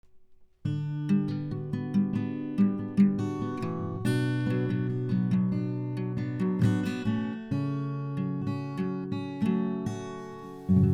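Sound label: focusrite scarlett solo 4th gen acoustic guitar; Focusrite Scarlett Solo 4th Gen - Акустична Гітара; Focusrite Scarlett Solo це бюджетний аудіоінтерфейс за 180 $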